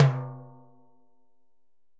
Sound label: Timbales A L Open; Latin Percussion; Drum Hits; Ableton; Factory Packs